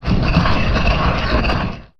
Stones

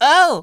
Grunt Cartoon; Audiosurf 2

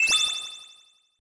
ui progress Small; Academy

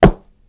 Arrowstorm; rabbids multiverse; iOS, macOS, Mobile

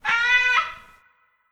Ara Parrot; Ara Parrot V O B1

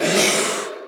Magician Damage

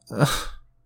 Chorus really sad

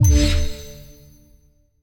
Ui Reward; Forza Motorsport 6 Apex

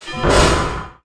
Skill Improved

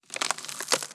Damned Tourment Skull

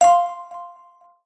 Sfx Basketball; Sfx Basketball Fever

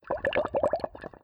Monnlight Shake

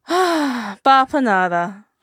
Shop Open; Iselda

